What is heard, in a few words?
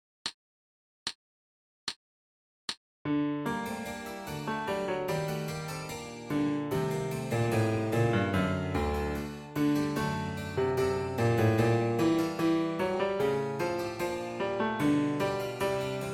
bari sax solo